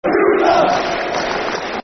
Clap, Football Chant (Soccer Song), Newcastle United; Mark Viduka (Clap); Newcastle United Nufc